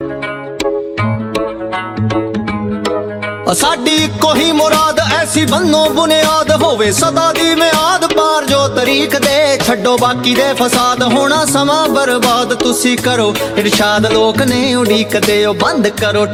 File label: Punjabi Ringtone